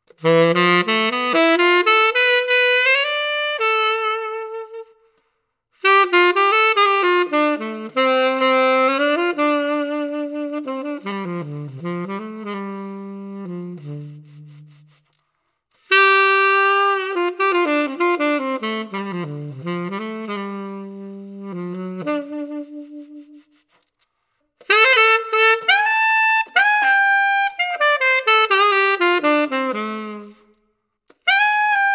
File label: Reverb; BSS; R Series